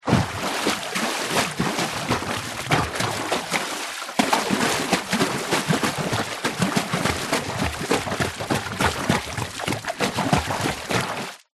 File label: sport swimmer thrash around in water